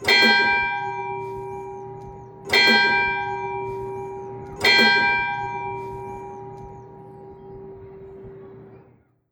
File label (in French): Tintement, sonneries; lille saint michel